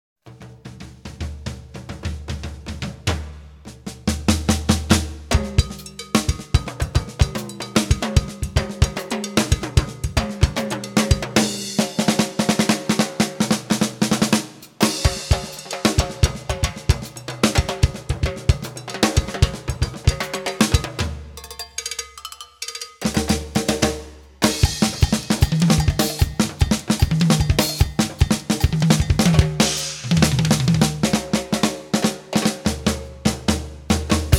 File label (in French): solo percussion et batterie